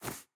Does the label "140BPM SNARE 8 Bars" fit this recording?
No